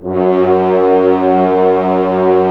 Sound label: Brass F.Hrns F#; Miroslav Vitous - Woodwind Brass Ensemble